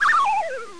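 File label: slide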